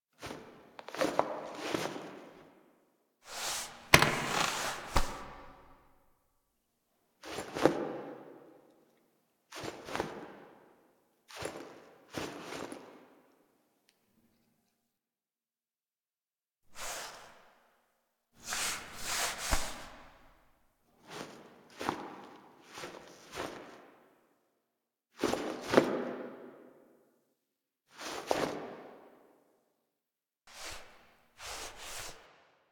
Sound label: Foley; Zariman; Table For Two